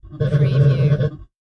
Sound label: Monster Mammal Bleat